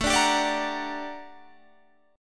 G Minor Major 7th, A, guitar chord

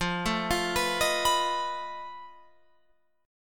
F 7th Flat 5th F down Guitar Open F; F7b5; arpeggiated

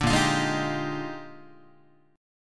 A sharp 13th, Guitar chord; strummed; JGuitar

